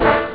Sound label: violin hit; speedball2; ST-XX Amiga Soundtracker Sample Packs